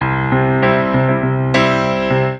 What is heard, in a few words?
Blues C Simple Piano One Bar